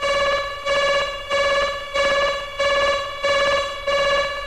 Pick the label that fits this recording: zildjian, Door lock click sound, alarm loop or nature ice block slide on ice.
alarm loop